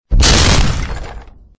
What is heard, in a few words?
door crash